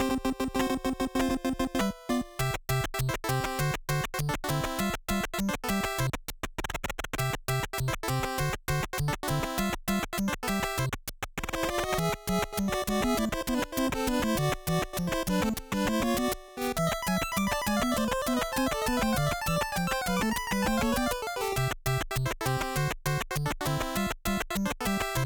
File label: Character Select (Hurry Up!); super mario maker 2; SMB2